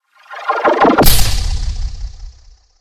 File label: f swoosh; cashnoire